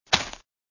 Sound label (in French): texture splasch